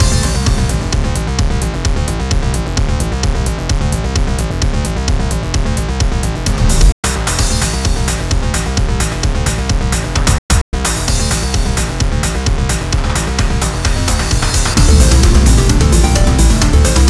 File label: BGM STAGE